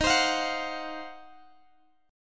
D sharp Minor 6th Add 9th D sharp Guitar Open G, chord